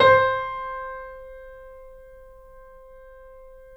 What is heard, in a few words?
C, Piano; InVision Interactive - The Piano